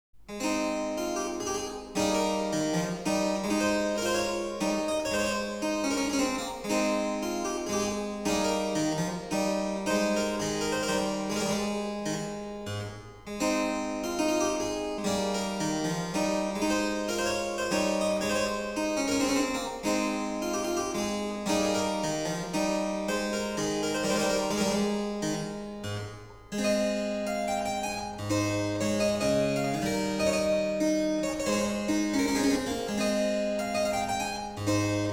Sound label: Harpsichord Music, Menuet en Rondeau; Johann Joseph Fux; Franz Zebinger.harpsichordist